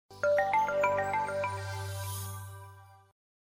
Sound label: boot audio; Coolpad ESurfing No1 2021